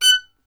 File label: STR VLN, Solo Instruments; Miroslav Vitous